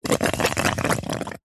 foley balloon pump in water bubbles gurgle squelch